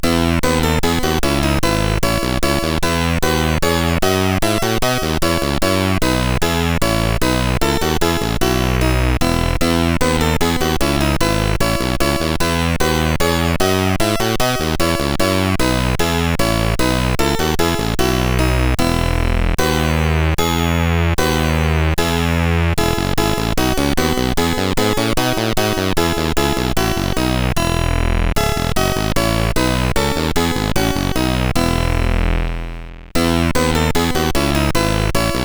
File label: Bach CSG8580R5